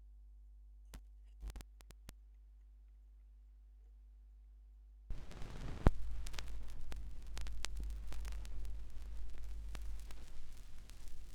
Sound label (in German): schallplattengeraeusche